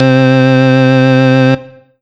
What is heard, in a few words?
Korg TR Rack Garage Organ C3